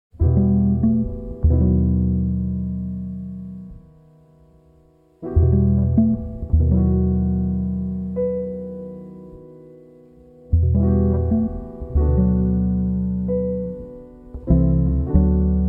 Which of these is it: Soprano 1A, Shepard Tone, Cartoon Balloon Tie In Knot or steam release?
Shepard Tone